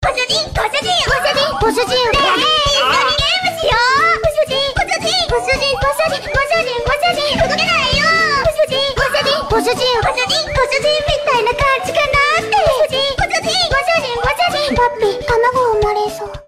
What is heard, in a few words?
harpy loop